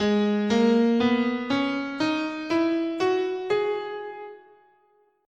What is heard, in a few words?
Ab Aeolian